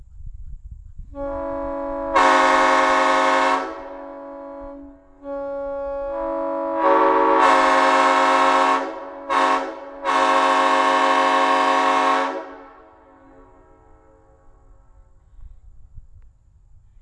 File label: Nathan M5 black, Horn